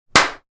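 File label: high five sounds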